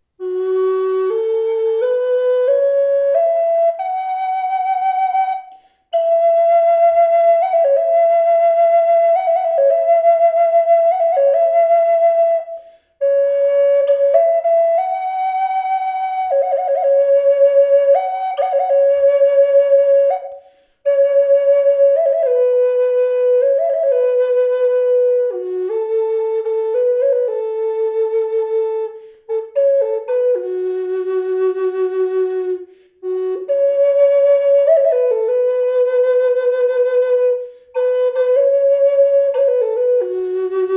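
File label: reverb, flute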